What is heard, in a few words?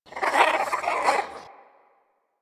Bird Of Prey Vocal Shake B; Warframe